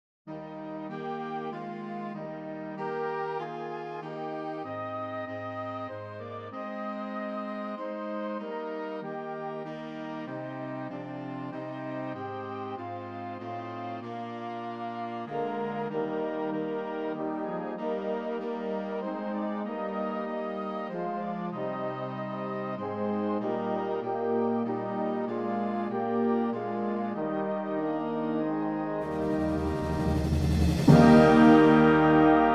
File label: cym